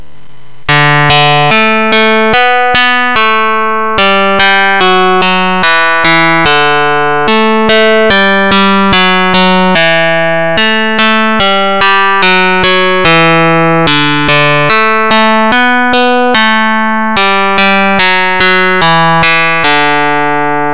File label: twinkle fpga